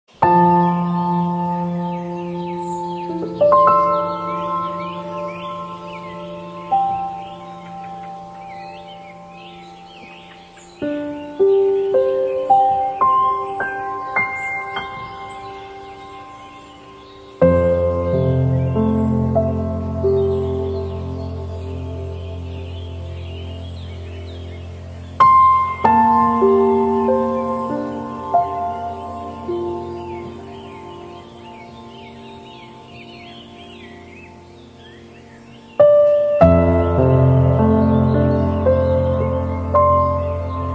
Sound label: Relaxing bird and piano sound; Sonido relajante de aves y piano en 3 minutos; Relaxing bird and piano sound in 3 minutes